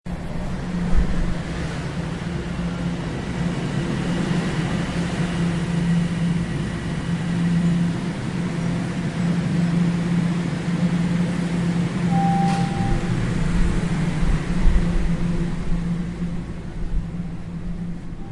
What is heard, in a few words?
Train